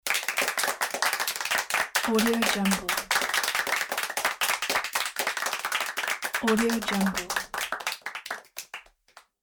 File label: small group applause